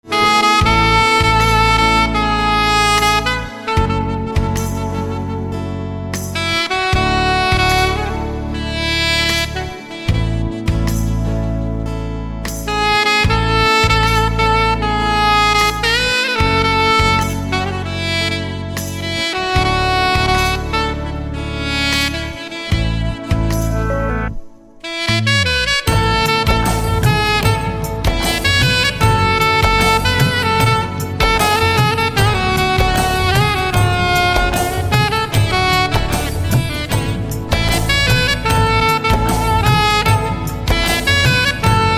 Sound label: Saxophone, instrumental ringtones